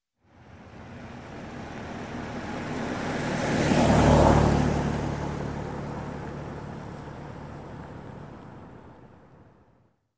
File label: Pickup Truck passing by